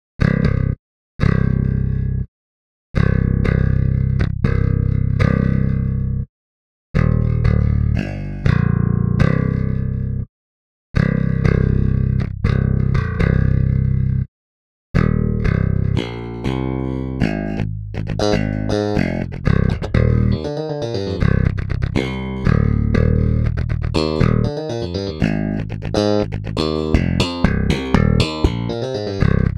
supermassive bass dry; polarity audio

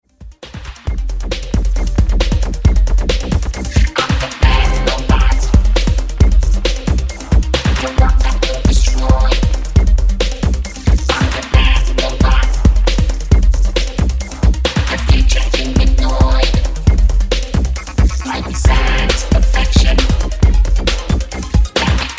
bad robot, vocoder; DSV-360 Vocoder by Design S

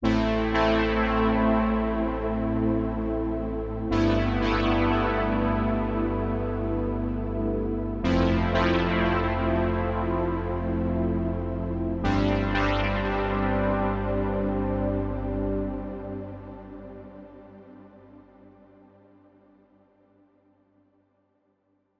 wowpad; eightysix; morphoice

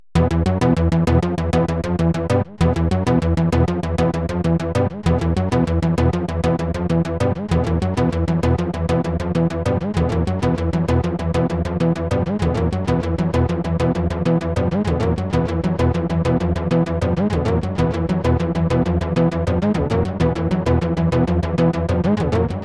synth bass, reactive delay; septimbral

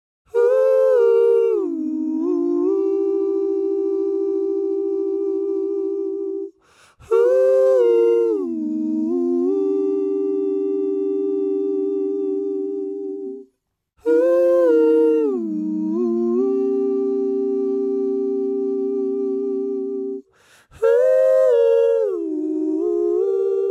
ooh

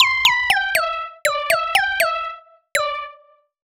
happy anime; ultimate vital bundle; rocket powered sound

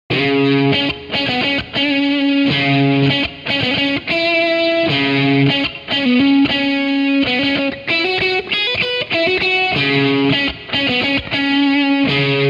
les paul amplif; chiqui audio

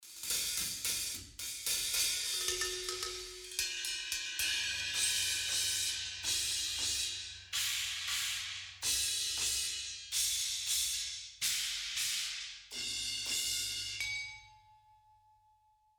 cymbals; pearl m p l; klangmacht

